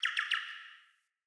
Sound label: Cardinal Male Bird Song